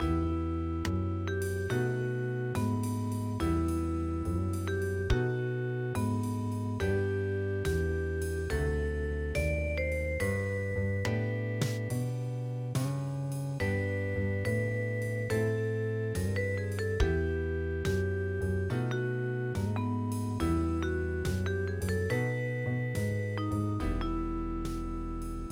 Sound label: Kosak Vib Jazz